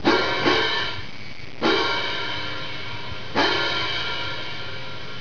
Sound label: cymbals